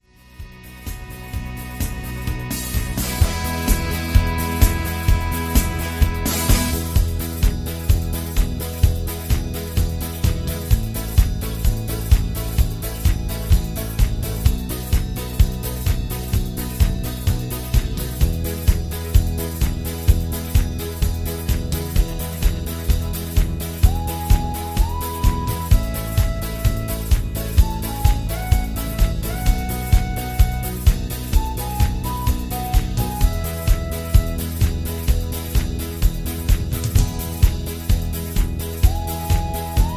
Patter

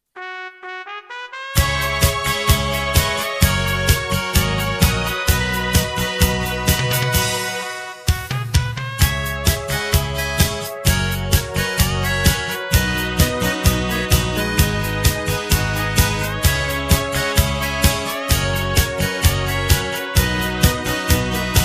Instrumental

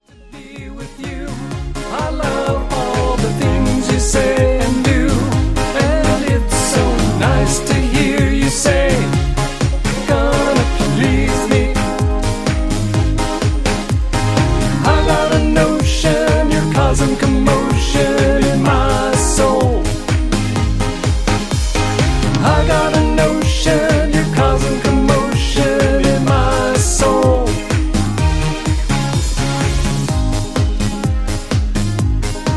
Instrumental with BGVs

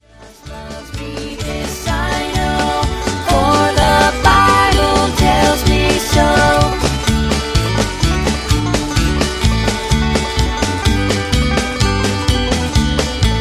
Harmony on All Singing High; Singing Calls

